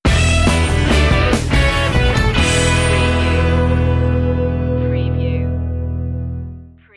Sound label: hippie stinger